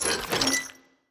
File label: basket tap; sfx